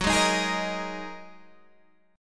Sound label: A sharp 7th Suspended 4th Sharp 5th F sharp, guitar